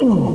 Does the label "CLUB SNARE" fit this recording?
No